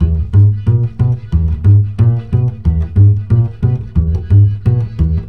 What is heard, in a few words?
BASS, Balkanish Traditions